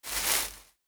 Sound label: Leaf